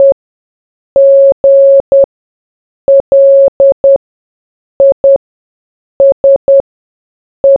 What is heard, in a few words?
morse